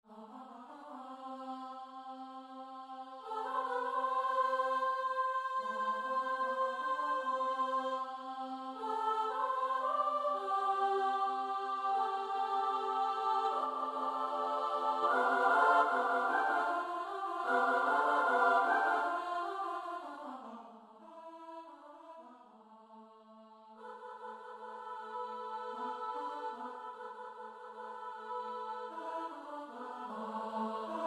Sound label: midi; twardowski; swit